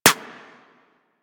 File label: Bullet Impact Generic; Surface